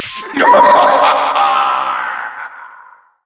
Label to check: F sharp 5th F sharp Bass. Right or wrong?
Wrong